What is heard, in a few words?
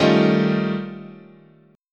Eb 9th Flat 5th, guitar chord